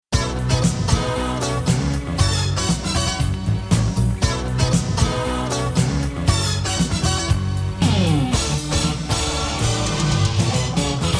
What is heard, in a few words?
Jingle, radio